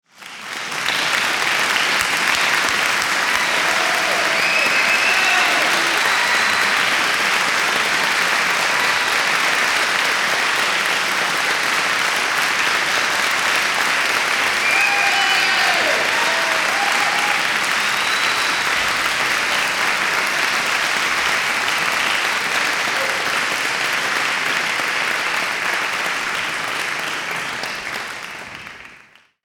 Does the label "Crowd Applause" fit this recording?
Yes